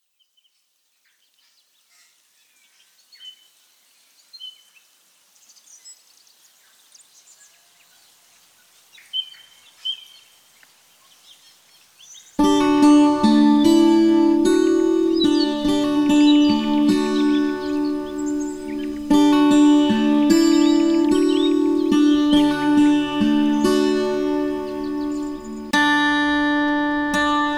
ambiance guitars; smorgasbord

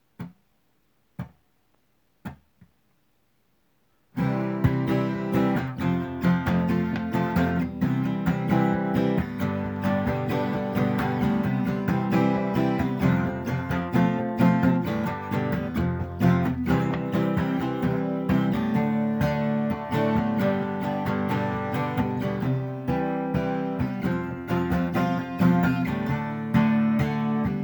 acoustic; brick and mortar; leos burger

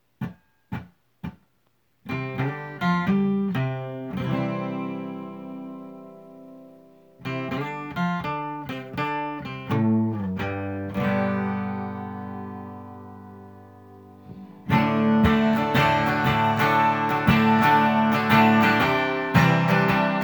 acoustic; brick and mortar; x wireless

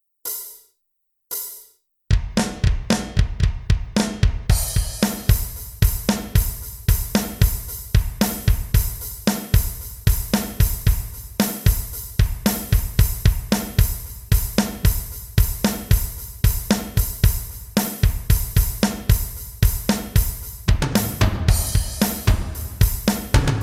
drums; brick and mortar; clear purpose beauty

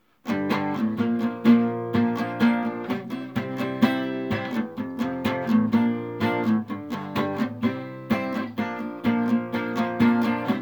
acoustic; brick and mortar

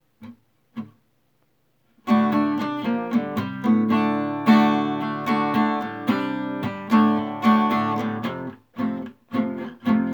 acoustic; brick and mortar; texas forever bar and grill

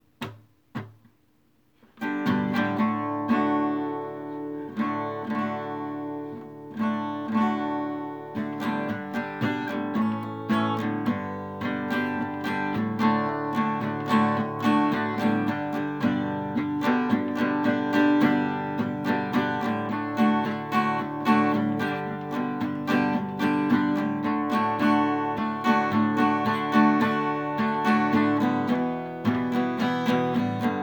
acoustic; brick and mortar; yoyos cafe

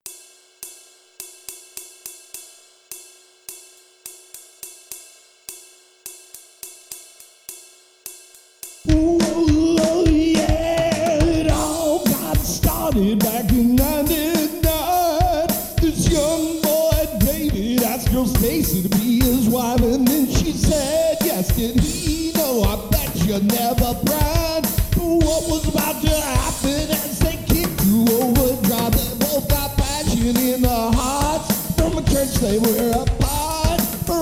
vocals scratch; onelivingchurch

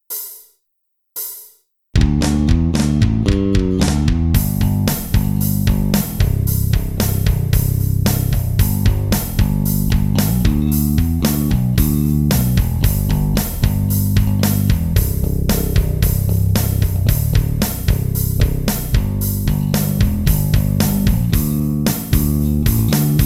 bass guitar; smorgasbord

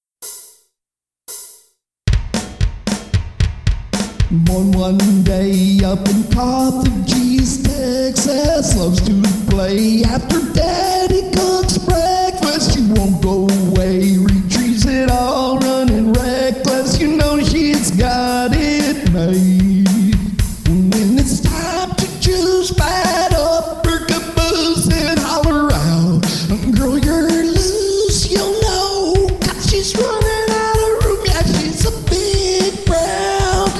vocals scratch; smorgasbord

